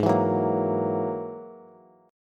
Ab 7th Suspended 2nd Sharp 5th, Bass, Bass Standard; Ab7sus2#5 strummed; JGuitar